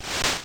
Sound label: slash Hit